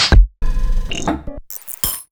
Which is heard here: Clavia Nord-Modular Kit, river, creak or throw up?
Clavia Nord-Modular Kit